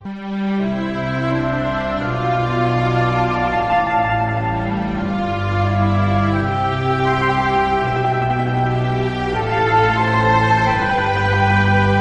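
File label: mandolin ringtone; captain corellis mando; samsung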